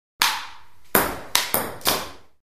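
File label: Ping Pong Ball Return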